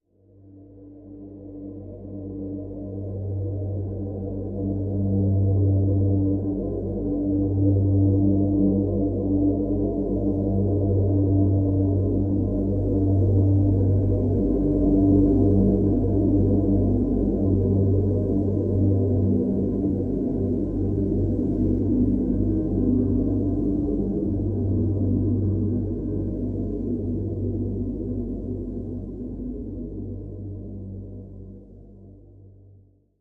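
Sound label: Drone River Crossing G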